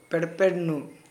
flute; Gutob

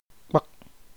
pull out pluck hari feathers uproot roots; ibibio

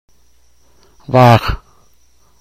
female zebu; teotitlan

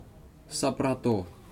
Waiting Song, futuna aniwa

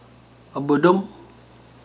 drum; ibibio

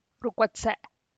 Laugh out loud; teotitlan

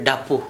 drum; Gta

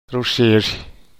sneeze; teotitlan

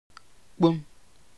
sound of the fallof an enamel cup; ibibio

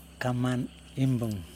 bamboo drum; Yokoim; kaman imbuN

